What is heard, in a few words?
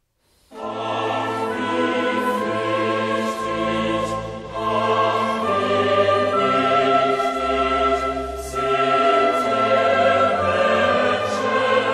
Chorale; BWV-26; Ach wie fluchtig, ach wie nichtig